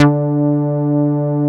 OB TITE D4; Drums In Your FACE!

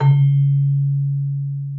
Celesta C#3; Xsample VOL-8

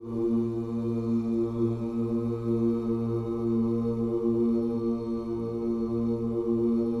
OOS A#, Vocal Gospel

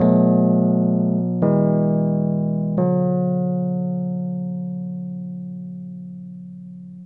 KEYS LP, Drum & Bass, 140 BPM; Ueberschall; Drum & Bass Flash Trackz

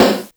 Rock SD Casio SA-1

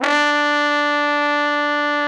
TROMBO; Trombone

